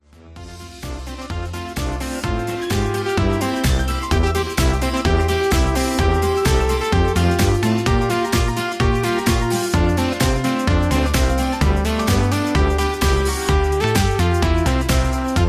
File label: Patter